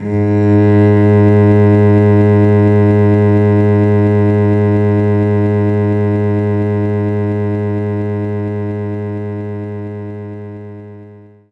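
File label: Cello G#1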